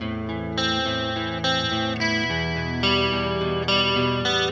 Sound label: Clean Arpeggio